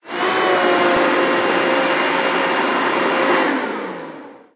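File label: Weapon launcher idle; rockit launcher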